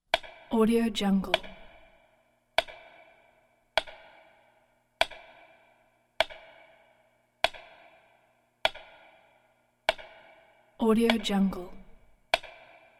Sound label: metronome